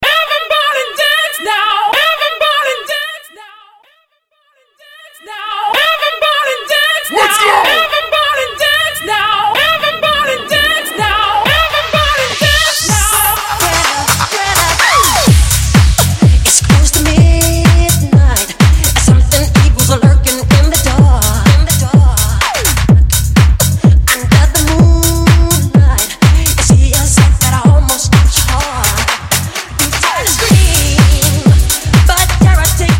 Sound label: Halloween House RMX; Mainstream VIP; Acap In Hype; Vegas Edit